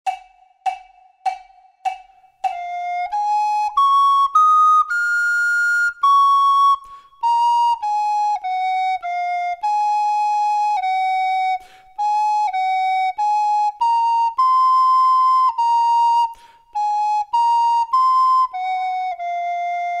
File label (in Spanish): DUETO FLAUTA